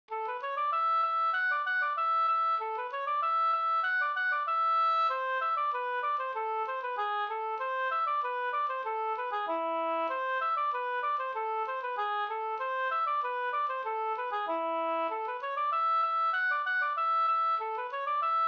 halling; fr Lysvik Vr Dybeck SvFm99

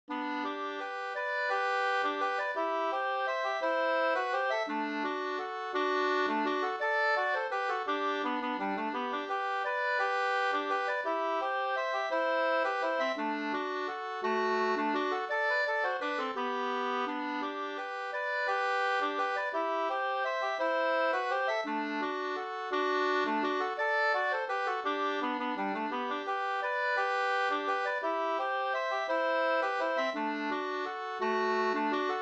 vals; Axel Andersson; Nykoping

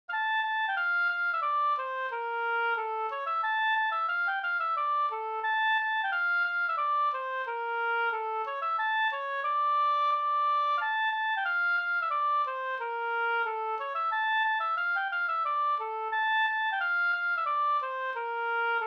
jenkka; Hintikan Matin jenkka fi

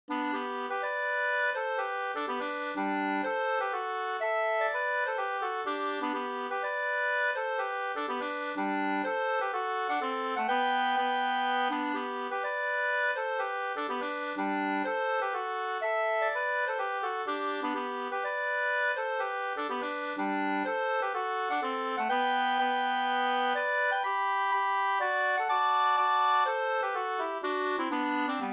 polska Bergsjopolska

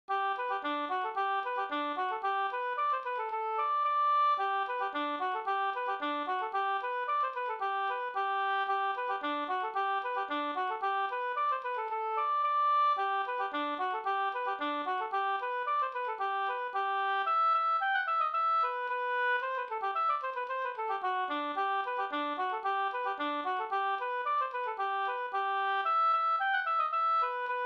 kadrilj; fr Flen SvL

